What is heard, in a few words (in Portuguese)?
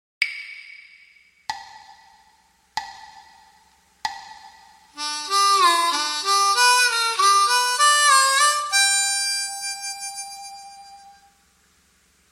gaita blues lick; gaita diatônica